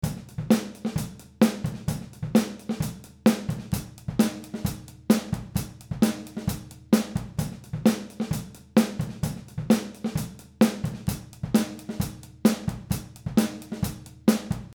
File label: compare ortf spaced card, oh positions